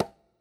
reels stop; Beauty Fruity; wazdan

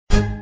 wild stop fast; Criss Cross 81; wazdan

